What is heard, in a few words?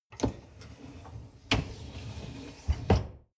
dishwasher upper tray draw in